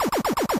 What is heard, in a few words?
Lazer